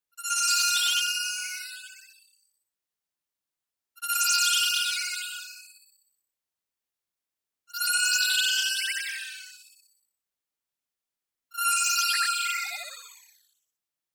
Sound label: SHIMMER Synth Crystals Freq Shift Delay; boom library; Anime Essentials; DSGNMisc